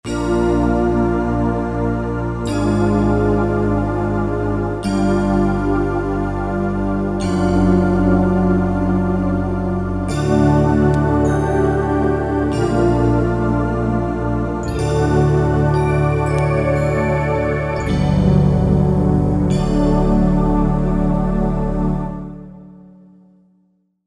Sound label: Best Pad